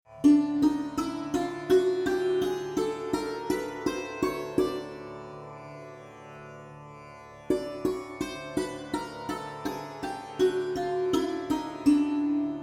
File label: Specific Swaras Drone; Hindustani; The 12 specific swara positions of the Hindustani system (S, r, R, g, G, m, M, P, d, D, n, N; ragas; ragajunglism